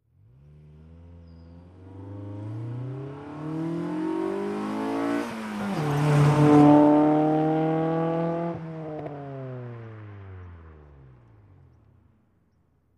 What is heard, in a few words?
Integra, fast approach, fast by, quick shift & decelerate away, Acura Integra